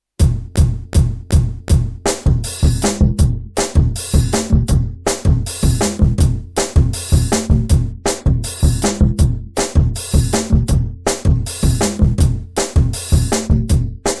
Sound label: pattern, drums